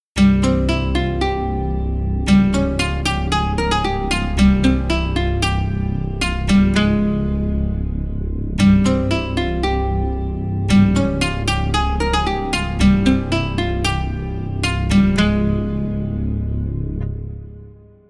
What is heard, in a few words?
114 BPM; Desert